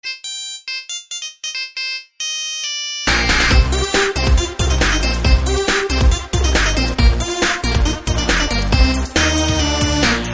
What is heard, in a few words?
rave; jamba